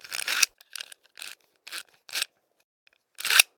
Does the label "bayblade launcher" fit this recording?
Yes